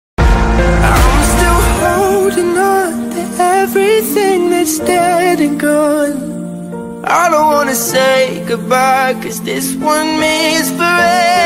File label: English Ringtone